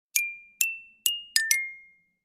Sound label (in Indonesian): Nada Dering iPhone WA